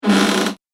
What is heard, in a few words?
Door Creak; Creaky Door